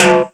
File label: Snare, Roland JX-305